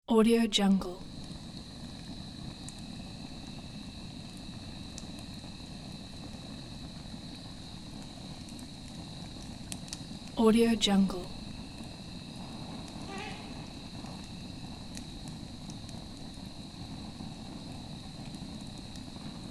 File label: night campfire; alexleadsounds